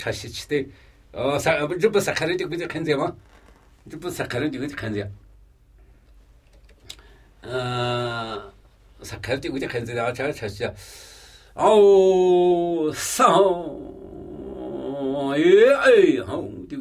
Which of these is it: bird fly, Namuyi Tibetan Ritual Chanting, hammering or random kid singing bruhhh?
Namuyi Tibetan Ritual Chanting